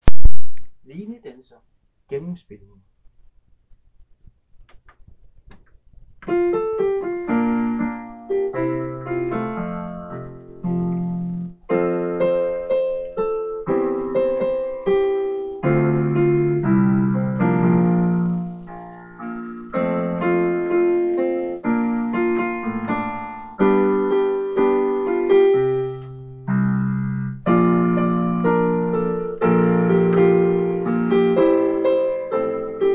linedanser - melodi og akkompagnement

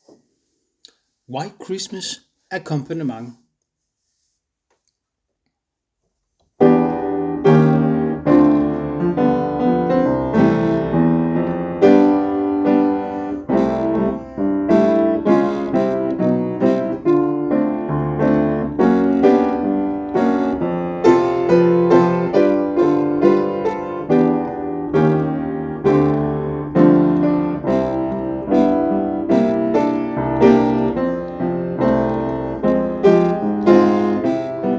white c - bas-melodi + akkom

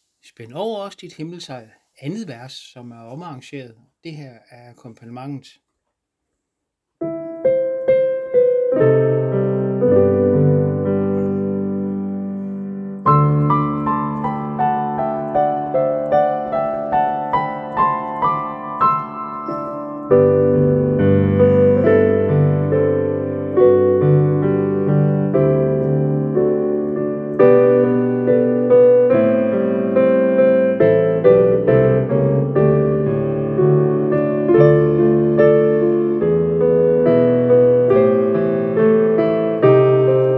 spaend over 2. vers akkompagnement; Spænd over os

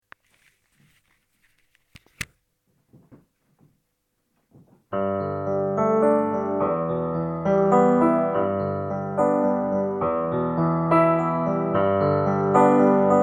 halleluja - akkompagnement

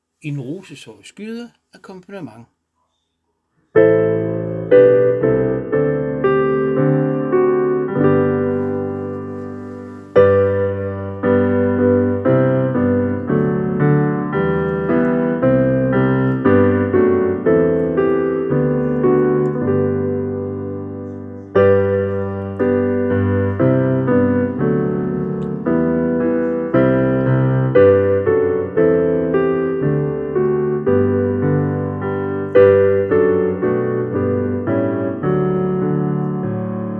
en rose akkompagnement

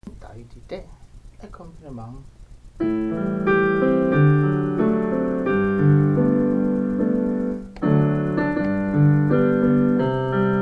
en dejlig dag akkompagnement

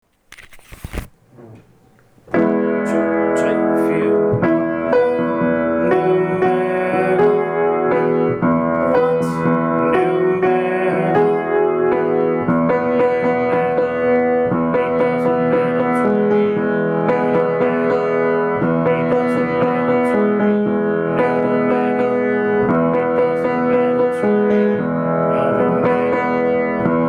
no matter - alt stemme - slutning

